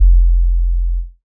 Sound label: shatter, 808s